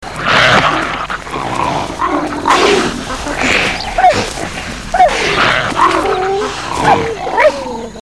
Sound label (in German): kampf, Geparden